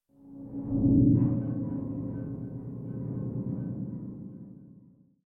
Ambient

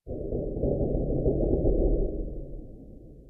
Ambient

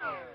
Bullet Miss; General

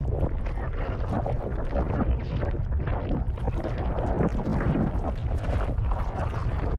Transform; SCP 427

